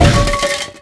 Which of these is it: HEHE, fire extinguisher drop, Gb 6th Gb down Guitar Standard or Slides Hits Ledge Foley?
fire extinguisher drop